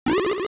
Magical Rod, Use the Magical Rod (also Wizzrobe shooting magic); LOZ